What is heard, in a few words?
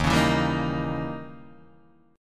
Eb 7th Flat 9th Eb, Guitar Open D